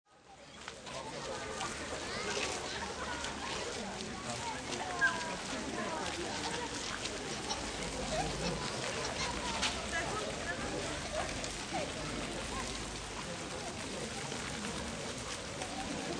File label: Crowd Park Ambience Busy, European city park ambience.; European city park ambience. People walking by and talking, some with baby pushchairs. Faint crowd; Outdoor Sound Effects; Lynne Music